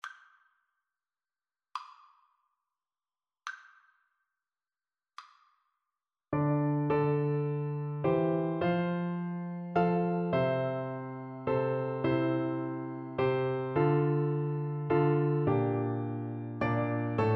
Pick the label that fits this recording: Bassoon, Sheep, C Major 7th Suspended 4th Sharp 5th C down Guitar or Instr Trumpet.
Bassoon